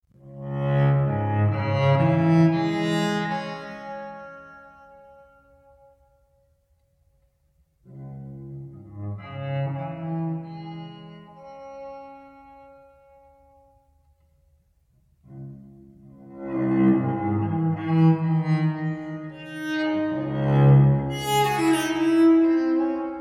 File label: pipa